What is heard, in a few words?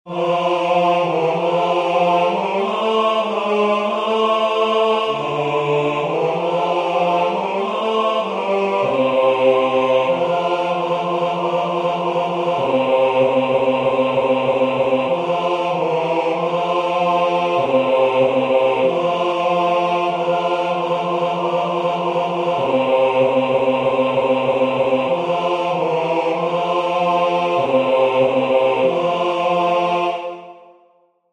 bass; Shepherds Came to Bethlehem